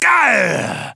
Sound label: Grunt Hit; Tiberius